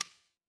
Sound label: ui click